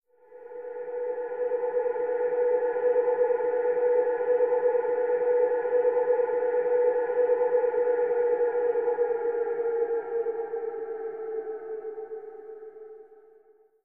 stinger; Myst III Exile

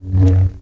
lightsaber swing fast; weapons

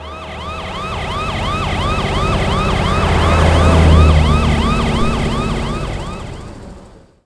van by

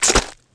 lemon wedge hit; bouncyobjects; Tony Hawk's Project 8; XBOX

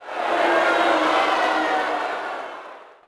Boo, Crowd, reactions

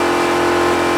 RPM, Engine, NASCAR; Cars; XBOX

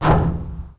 4WD impact

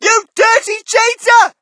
crowd, shout; Mike Tyson Heavyweight Boxing

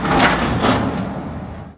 elevator open

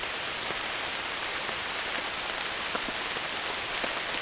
rain; Pirates Of The Caribbean

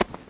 hand grab person

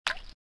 waterdrop; ambience; Star Wars Jedi Knight II Jedi Outcast; XBOX

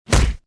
punch, melee; weapons

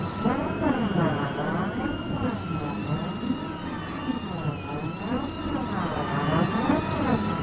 airport; fuusoku

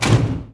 door small Metal end; doors elevators; Star Wars Obi-Wan; XBOX

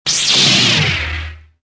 saber on; weapons

